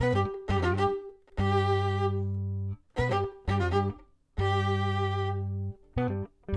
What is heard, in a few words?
violin